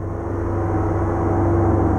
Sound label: Ambience, dark textures; Multisampled; Instruments; Arcocymb Ambience; Ableton; Mood Reel